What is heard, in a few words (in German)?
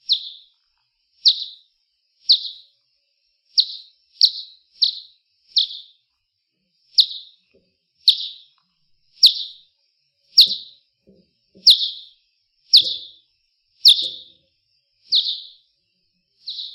Mausohr, Fledermausrufe; fledermäuse rufe